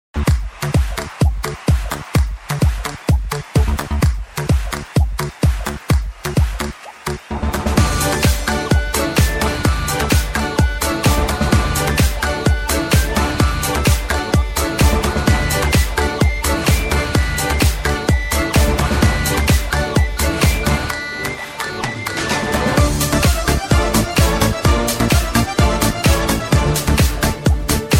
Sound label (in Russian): оркестр